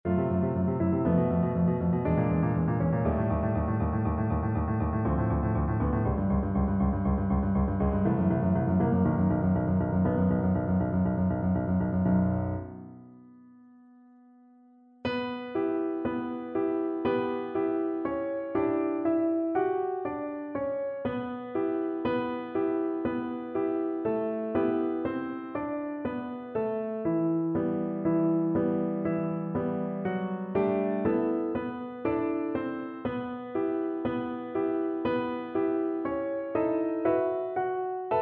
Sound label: tu che di viola